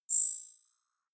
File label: zoom in sweep